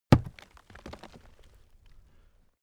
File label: axe hit